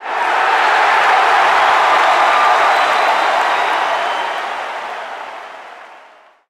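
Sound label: Basketball Crowd Large Cheer; SFX Kit; Crowds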